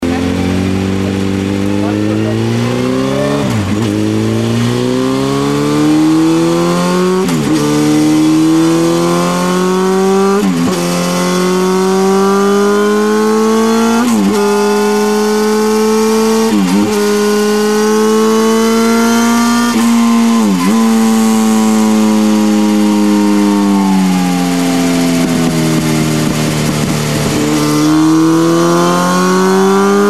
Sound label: birdy dyno